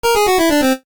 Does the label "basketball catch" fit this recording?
No